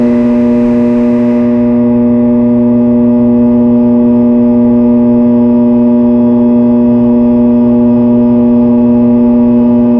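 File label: the sound of a transformer, electric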